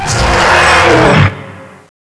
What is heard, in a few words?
big flinch; tubequeen